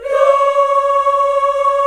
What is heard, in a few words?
VOX, African Aahs, African Las